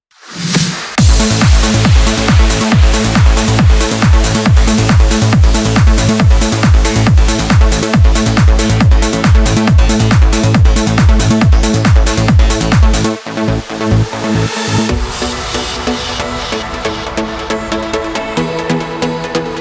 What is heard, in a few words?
uplifting trance